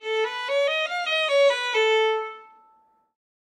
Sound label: Violin Whole Note Runs